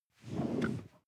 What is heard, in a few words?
sports car int seat slide; verkehr transport; autos